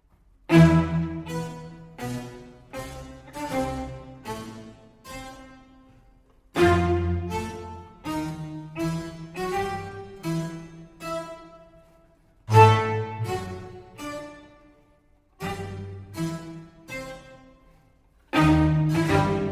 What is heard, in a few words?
Concerto for Violin and Strings in C Major Largo; Vivaldi; Concerti per violino IV; L'imperatore; Riccardo Minasi